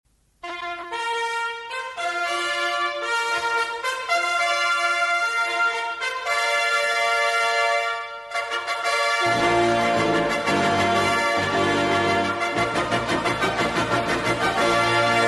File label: olympia fanfare; offizielle Musik der Olympischen Spiele 1972; München